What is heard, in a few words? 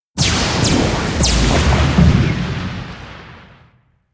Grotto Destruction